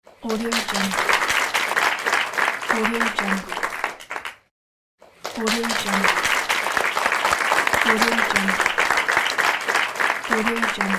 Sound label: clapping applause of a small crowd